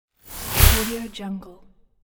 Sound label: futuristic transformation